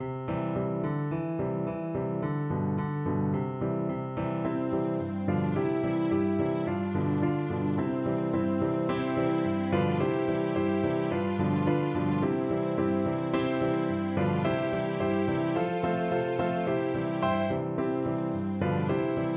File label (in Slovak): husle, piano; mala husle; sedemdesiat sukien